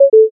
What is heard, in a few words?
error